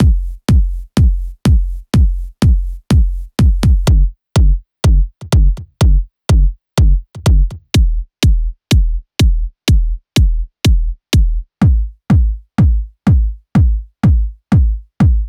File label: tech house; tech house producer essentials